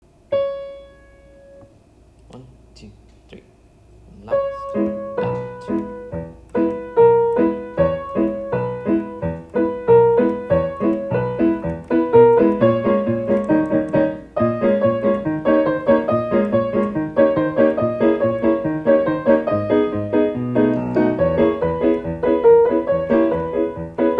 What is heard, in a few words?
melody